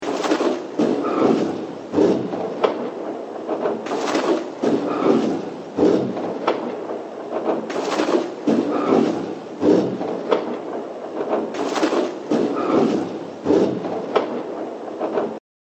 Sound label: Ambient Noise 125bpm, Soundscapes; Crowdsourced Sample Pack